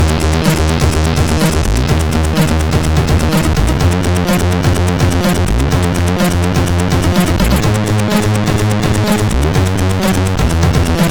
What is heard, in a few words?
Bass sid CSG8580R5